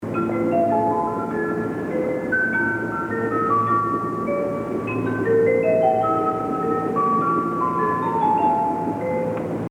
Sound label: melody; 3・4番線発車メロディ; 「乙女の祈り」; 大崎駅; 永楽電機製のメロディ; jreast yamanote